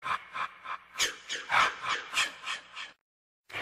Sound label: scary sound